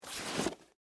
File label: Boot Take Off; Foley; Dressing